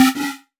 Synths C#; Vengeance Samples

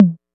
Tom; Percs

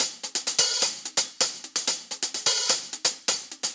Real Hihats, 128BPM; Loops; Vengeance Samples

